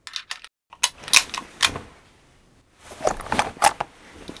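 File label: infantry weapons foley; Red Orchestra Ostfront 41-45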